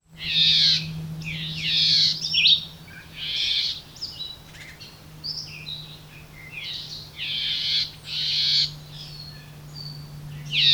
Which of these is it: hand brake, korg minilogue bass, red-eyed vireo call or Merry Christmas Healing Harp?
red-eyed vireo call